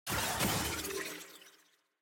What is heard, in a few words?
ui map click; gwen